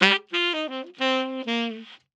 Sax Tenor; Zulu

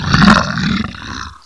Lion

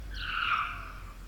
Distant Car Tire Screetch

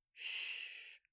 Long Sh

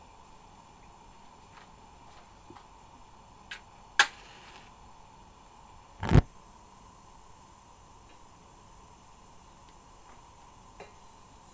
Gas Ignition With A Match

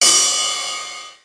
Ride

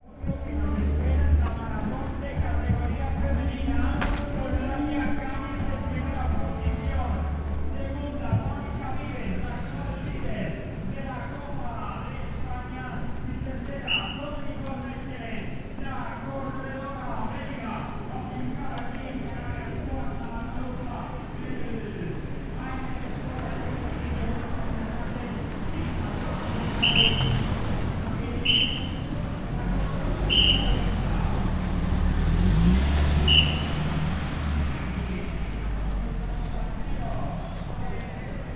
Street Atmosphere And Police Whistles

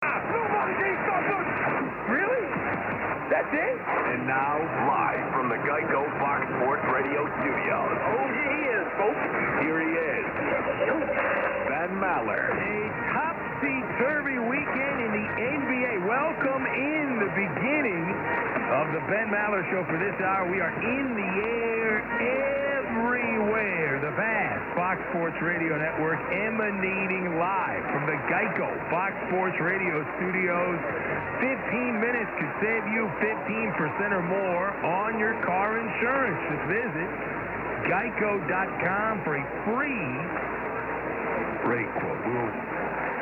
AM Radio Sounds

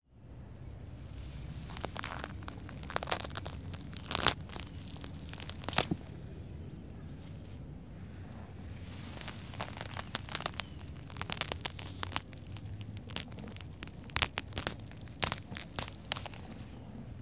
Grass Crunch

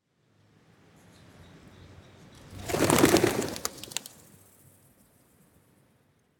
Pigeons Taking Off

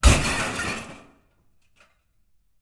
Crash

